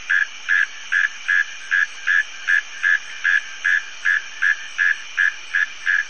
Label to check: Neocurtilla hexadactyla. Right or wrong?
Right